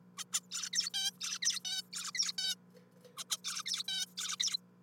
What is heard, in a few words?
bird recording